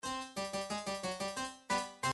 golf bonus game lost; Gameboy Wario Land 3

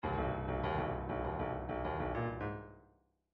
midi fills rock

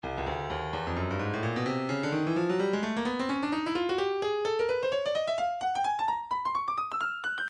breakbeat 129 bpm; Stylus Breakbeat Midi Files 125 129 Bpm

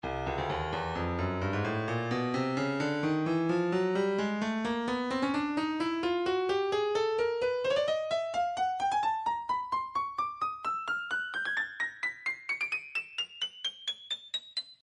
stylus breakbeat midi files 130 bpm

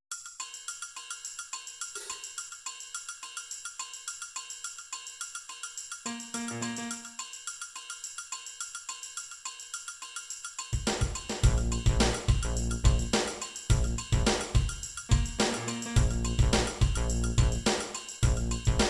italian, midi

